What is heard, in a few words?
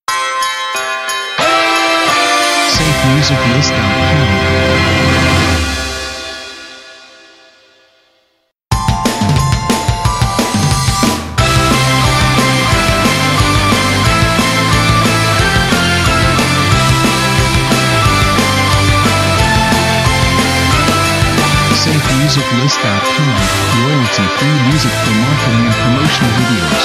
Indie Rock Christmas Video Intros; SafeMusicList